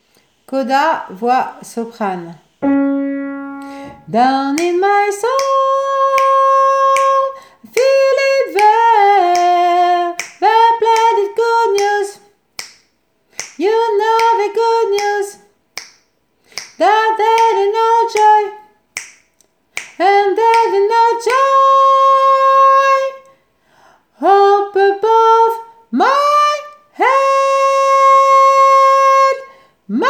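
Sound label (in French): voix soprano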